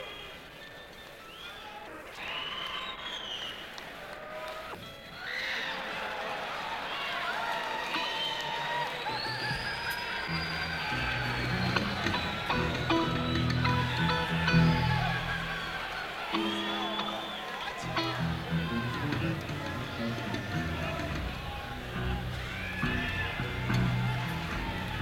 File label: Crowd; senn421